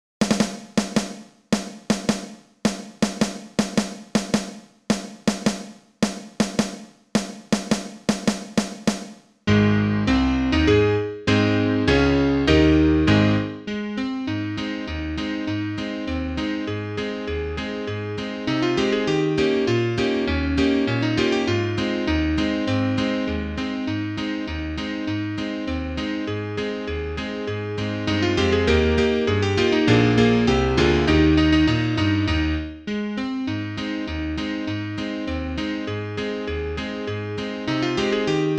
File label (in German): Klavier Solo, trompete; Muss i denn zum Städtele hinaus